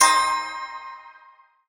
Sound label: Sign In Reward